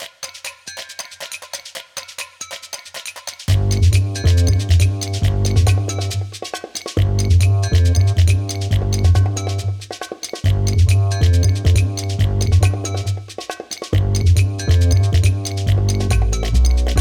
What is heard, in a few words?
Breaking the Ice Drum 'n' Bass; Rayman Origins; Gourmand Land